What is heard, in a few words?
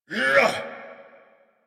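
Ability Exert; Warframe; Veilbreaker; Kahl Barks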